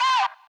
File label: CHANT AYYE, Vox